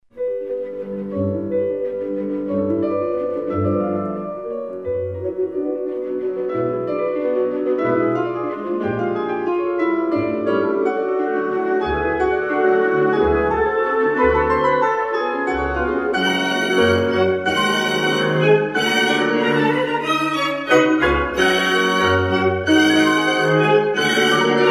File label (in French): Ballade piano et orch; Faure